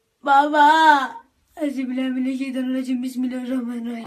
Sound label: babaaag